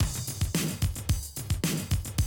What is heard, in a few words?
Classic DrumLoops, 110